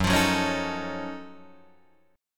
F sharp Minor Major 13th, Guitar DADGAD

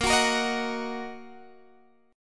A sharp Suspended 4th Sharp 5th Guitar Open G

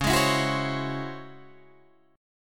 C sharp Minor Major 11th, Guitar Open G; 11,13,14,11,13,11

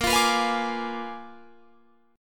A sharp 7th Flat 9th A sharp down Guitar Drop D

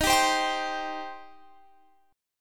C Minor D sharp

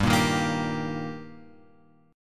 G Minor Sharp 5th G, chord; Gm#5 Chord {3 1 1 3 X 3} strummed

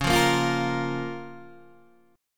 Db 6th, Guitar, DADGAD

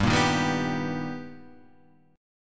B Augmented Major 7th G, chord

